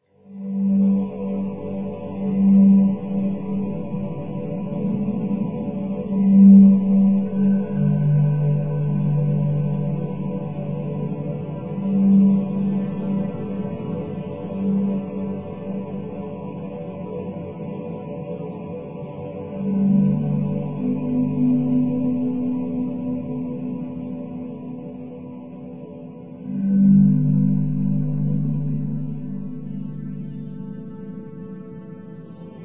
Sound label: drone